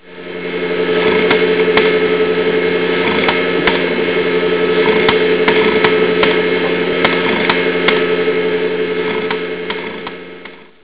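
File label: Bad Head, hard drive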